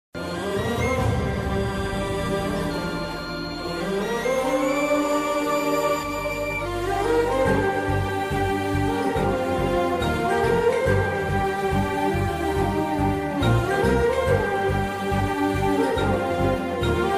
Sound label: south movie heart touching bgm ringtone; premam; chitralahari